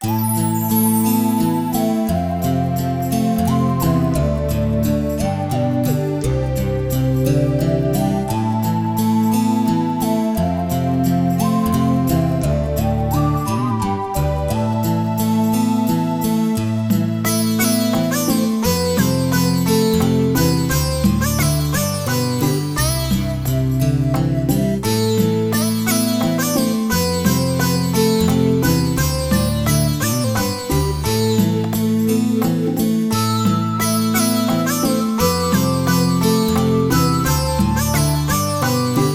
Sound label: tavern, Music; DNF